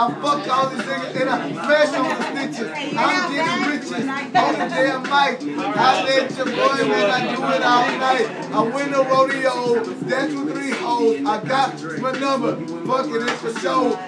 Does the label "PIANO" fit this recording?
No